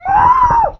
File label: moo, tuba